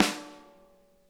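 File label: center, snare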